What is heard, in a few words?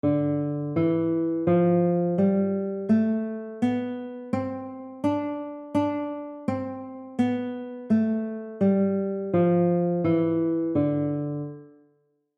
D dorian scale